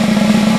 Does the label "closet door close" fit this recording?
No